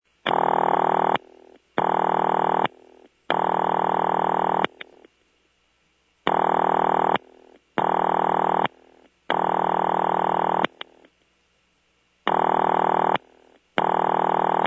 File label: Coded Ring (2 short, 1 long); sounds from preserved step by step switches; Phil McCarter